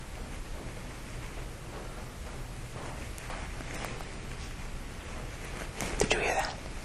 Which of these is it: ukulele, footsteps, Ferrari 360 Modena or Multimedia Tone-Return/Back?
footsteps